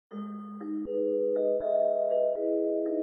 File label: message tone